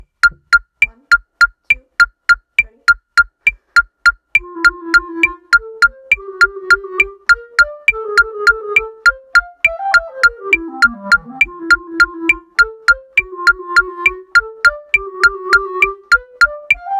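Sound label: clarinet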